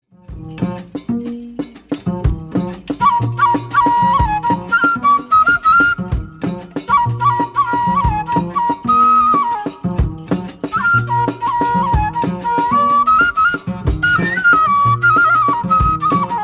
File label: Flute song